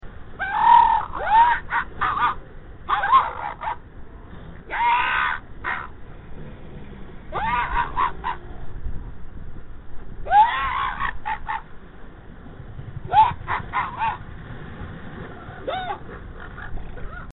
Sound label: Elephant Seal Pup; Monica Bond